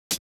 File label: Drums, 090 Cmin